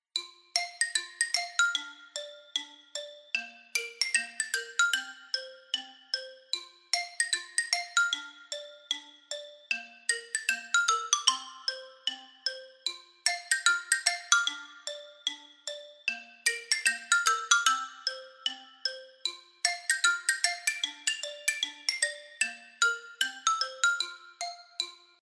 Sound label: shop bgm